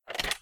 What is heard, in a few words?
Door Opening